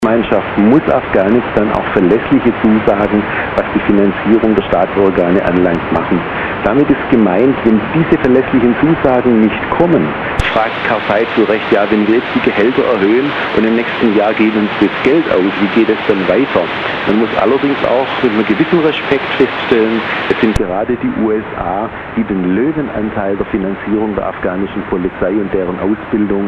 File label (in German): Mittelwelle 756Khz